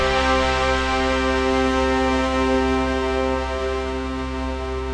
victory horn; sound effects

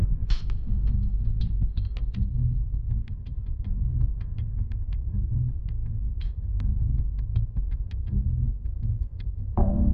suspense music; sound effects

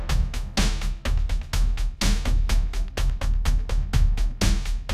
drum roll; sound effects

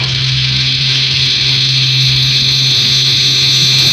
thunder

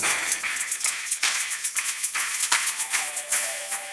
bass drop; sound effects

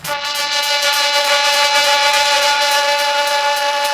sad trombone

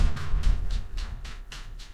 camera shutter

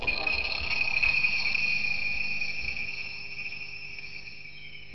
crickets; awkward sounds

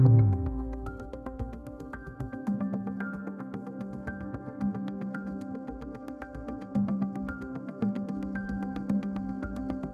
tension music; sound effects

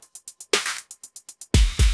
pop sound; sound effects

